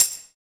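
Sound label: Kawai ACR-20 shaker tambourine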